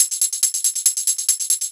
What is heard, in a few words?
Loop 140 BPM; Vengeance Samples